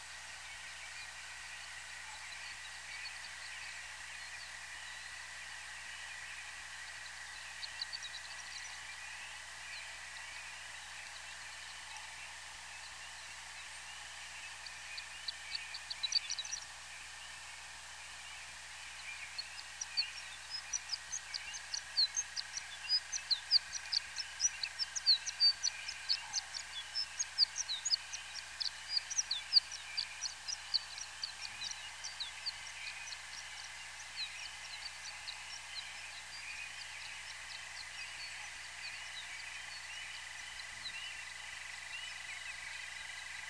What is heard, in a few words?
Horned Lark flightsong